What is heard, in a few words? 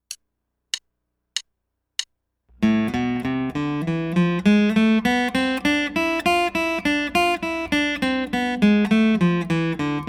Picking Studies In Am